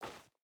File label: footstep hide dirt walk; Rust